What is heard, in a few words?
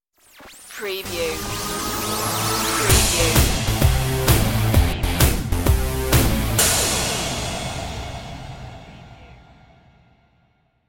pop tv stinger